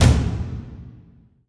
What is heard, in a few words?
drums